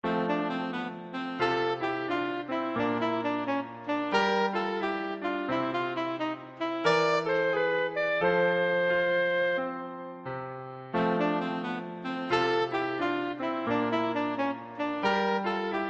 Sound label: alto; flowers